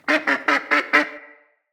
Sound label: Freed Duck Call; Encounters; Animal Rescue